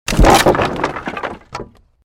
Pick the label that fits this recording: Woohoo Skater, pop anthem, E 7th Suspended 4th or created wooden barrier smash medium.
created wooden barrier smash medium